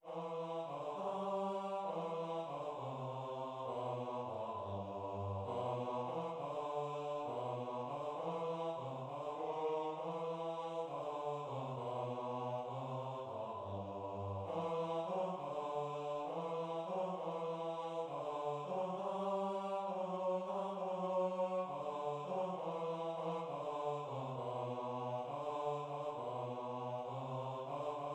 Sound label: bass, Gaelic choir; chi mi bhuam